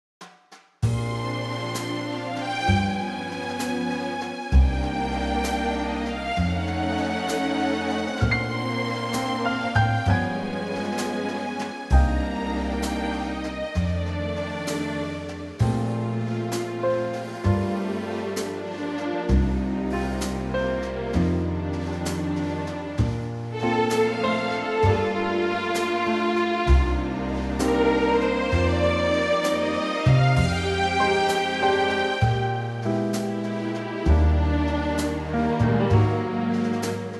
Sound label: Bb Orchestral, female